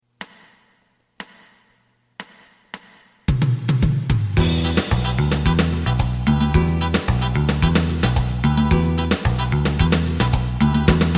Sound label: lead